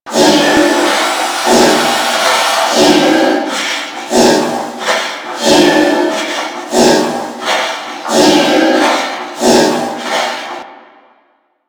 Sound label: Ocean Poison Pump Loop; Ambience; Props; Warframe; Grineer Ocean